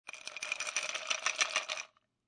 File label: Ice Cubes In Glass Cup, Jiggle And Shake; Foley; Shaking